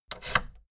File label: fader plastic scrape double up fast